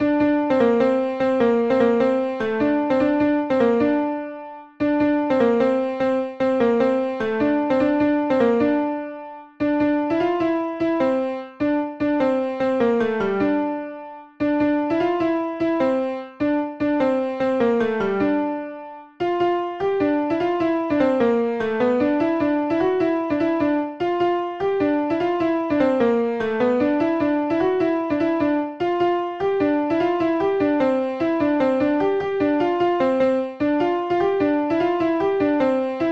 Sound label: Alto Part; choral; Black Alman; These are individual parts broken out for songs in the choral ball set